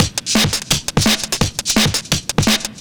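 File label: Drum and Bass Beat 170, drumnbass 170bpm; musicradar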